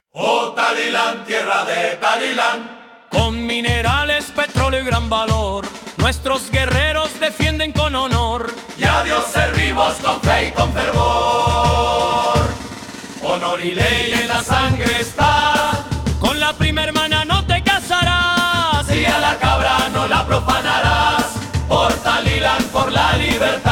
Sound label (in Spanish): Himno; taliland